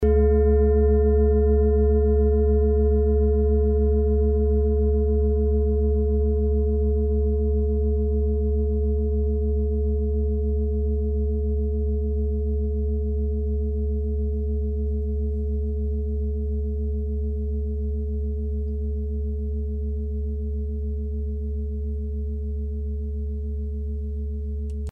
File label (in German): klangschale ladakh